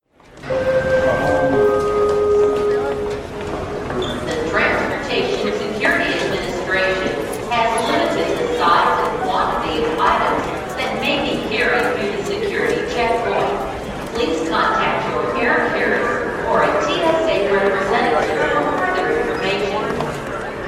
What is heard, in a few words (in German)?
flughafen ansage airport gong sound